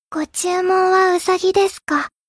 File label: applause